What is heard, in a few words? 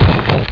Fireball Hit; BOOM